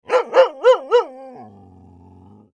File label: large dog barking; sound ideas